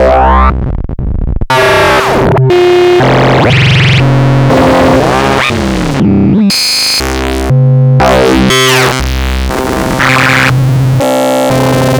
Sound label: texturepack sampler